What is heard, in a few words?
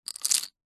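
leisure poker chips handful set down hard surface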